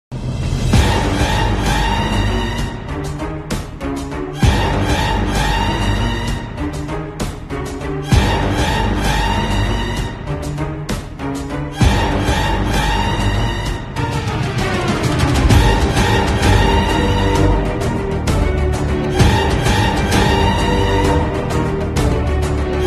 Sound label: Mobile Ringtone